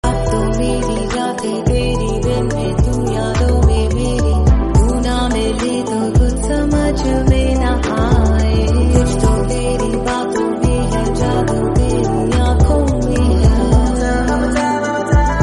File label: romantic song tone ringtone